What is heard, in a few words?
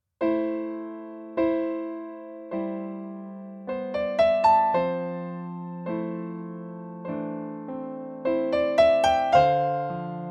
stagepianos kawai grandpiano